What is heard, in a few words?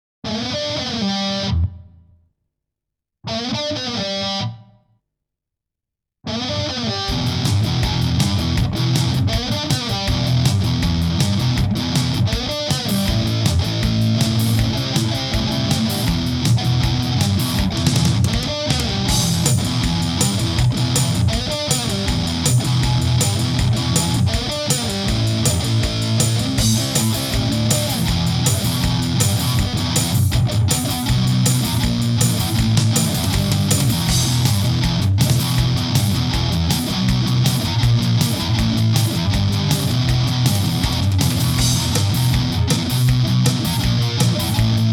Rock 160 bpm